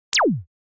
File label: Beam Laser